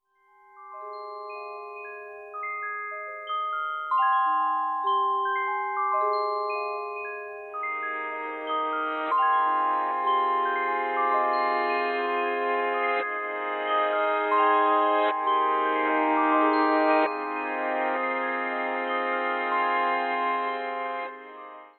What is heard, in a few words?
kids bells ringtone; galaxy ringtones